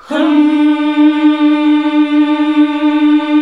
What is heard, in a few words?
HMM D#2, classic vox